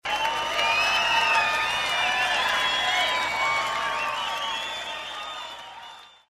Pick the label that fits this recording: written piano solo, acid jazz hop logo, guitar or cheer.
cheer